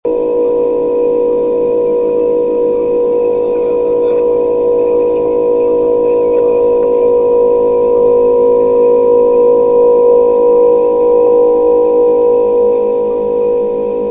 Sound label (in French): regle macon; berck 2004